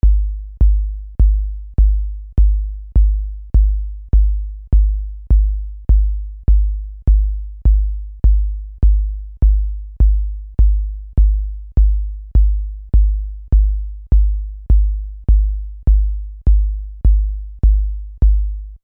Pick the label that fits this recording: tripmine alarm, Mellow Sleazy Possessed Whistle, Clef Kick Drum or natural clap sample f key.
Clef Kick Drum